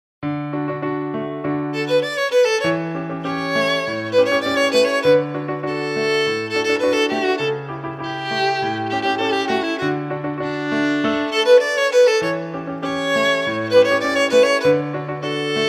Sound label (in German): Neapolitanisches Tanzlied; Tschaikowski; Aus der musikalischen Schatzkiste 1 - Violine